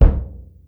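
bass drum orch mute, Drum Machines, Yamaha Motif ES-Series; 300 Drum Machines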